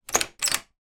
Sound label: household key mortice in door turn